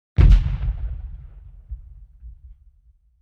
Explosion Large Booming

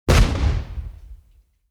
Explosion Short Small

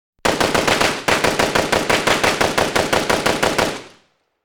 AK-47 Multiple Bursts

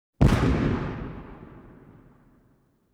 Explosion Medium Size

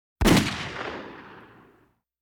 Automatic Weapon Single Shot

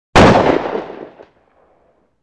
Large Gun Fires Single Shot

